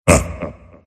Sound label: laugh; earthshaker; arcana